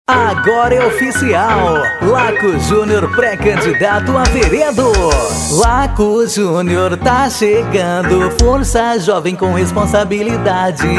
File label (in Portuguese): jingle